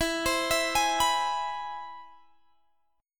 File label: C Augmented Major 7th E